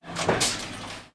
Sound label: machine attack; megaglest